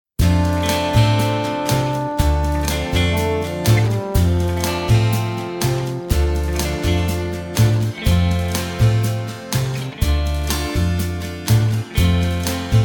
(F) No Backing Vocals

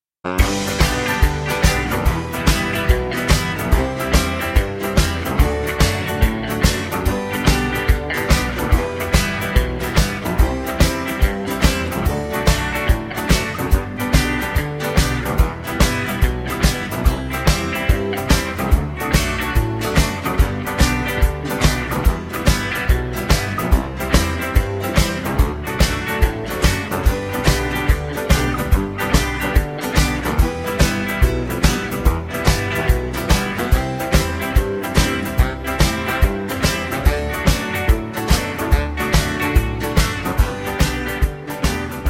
(G) No Backing Vocals

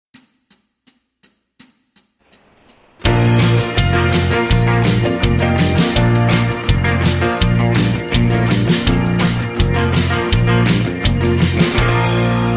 No Lead Guitar No Backing Vocals

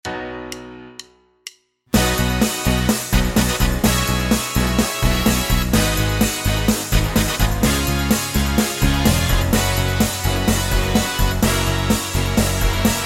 (B) No Backing Vocals

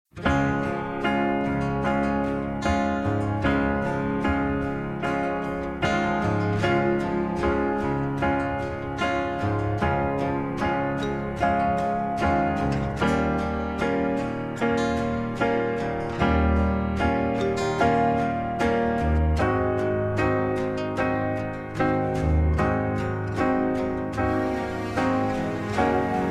Db No Backing Vocals, backing tracks

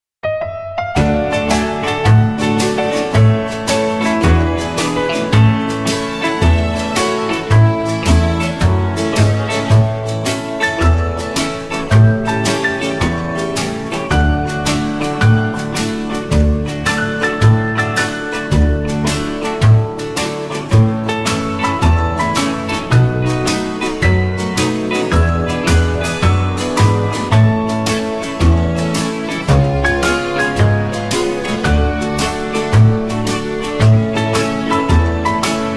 (A) No Backing Vocals

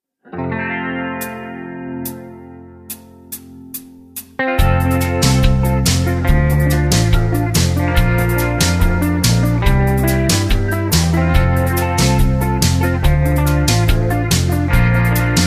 (F) No Backing Vocals

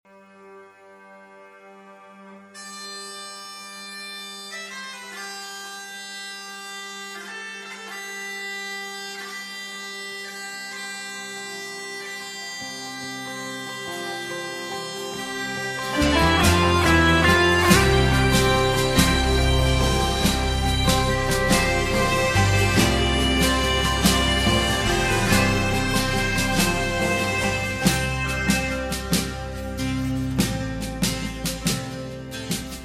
C No Backing Vocals, backing tracks

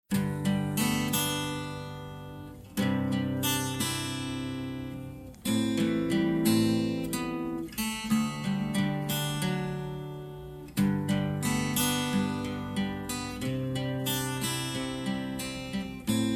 No Backing Vocals, (G)

